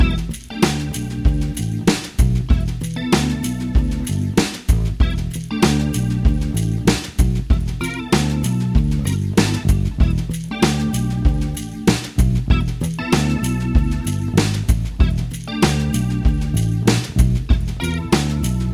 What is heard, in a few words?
solo loop